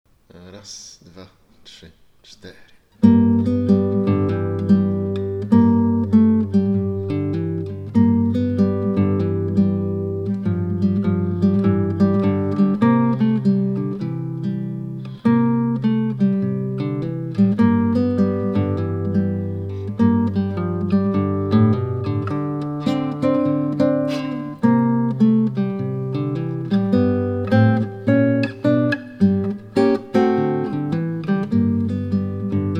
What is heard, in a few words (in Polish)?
blues, gitara; M. Dziedzic; Blues w tonacji G dur